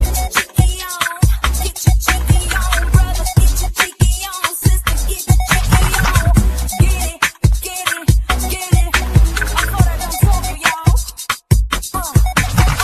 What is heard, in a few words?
Funky Tone